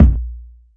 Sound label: classic hip hop kick; STYLE KITS; Classic Hip Hop Mega Kit